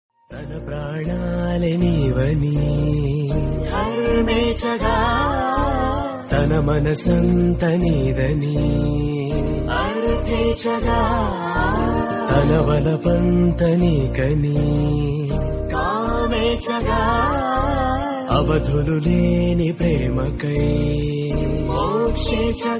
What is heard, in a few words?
telugu marriage; pelli patalu